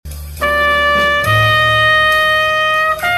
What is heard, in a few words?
D7 alt